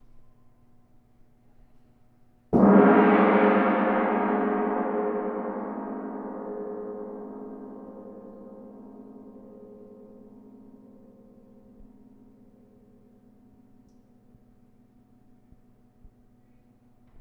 gong

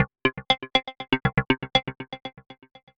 Blips arp 120bpm

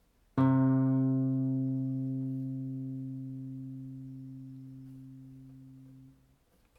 Classical Guitar C 3 Plucked Non Vibrato

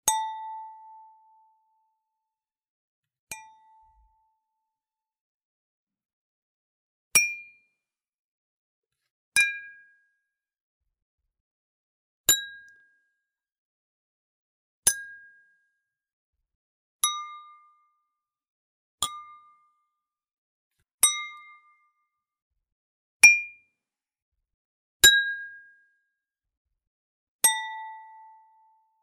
Glass Ping Variations

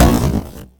Alien Ray Gun

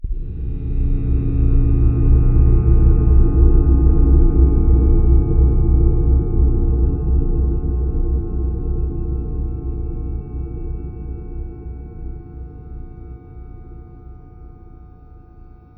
Scary Ambient Swell

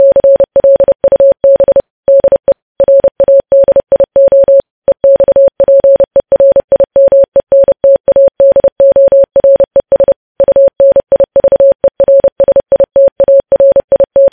Morse 30 Wps

